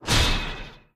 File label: pupbomb; john carter; lost symbol